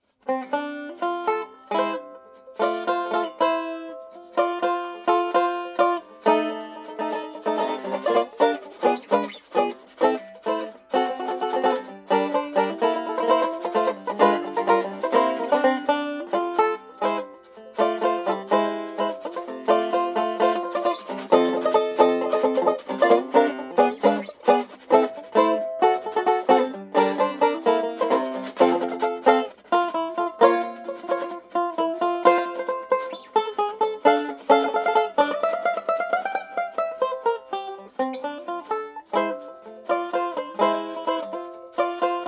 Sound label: tenor fall, banjos